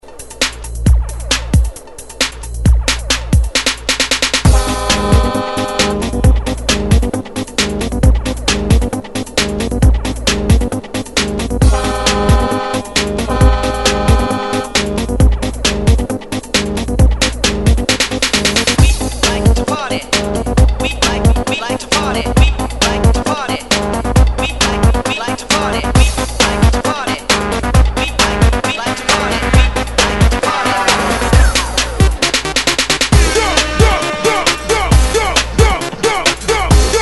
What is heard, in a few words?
Hype ReDrum 134 Bpm; DJDOLLS